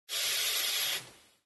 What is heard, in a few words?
snake slow